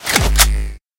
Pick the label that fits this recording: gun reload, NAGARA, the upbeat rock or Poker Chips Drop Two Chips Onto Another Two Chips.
gun reload